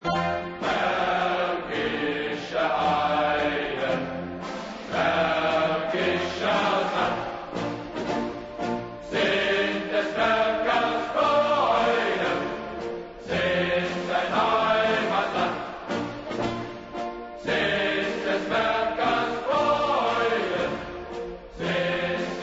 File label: marsch hymn